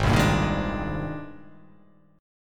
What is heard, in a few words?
Ab-Major Sharp 11th, AbM#11 chord; strummed; JGuitar